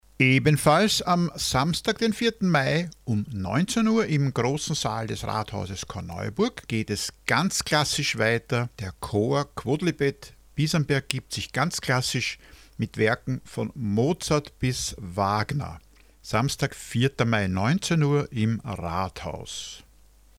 Chor; Quodlibet; Rathaus Korneuburg

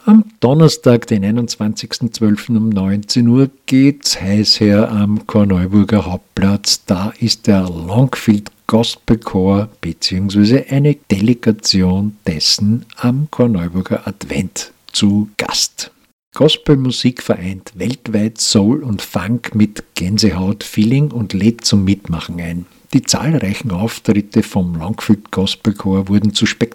Gospel Chor; Longfield Gospel Chor Delegation; Adventmarkt Korneuburg

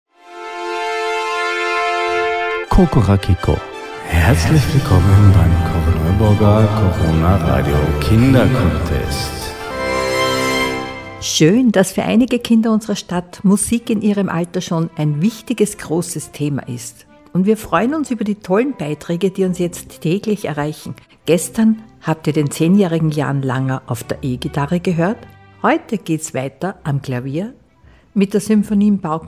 Klavier

